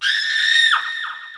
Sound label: scream